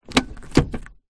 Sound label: transport car suv handbrake release; holden captiva